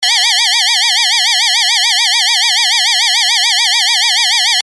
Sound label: alarm